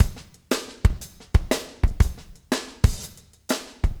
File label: motown 120, loops, 120bpm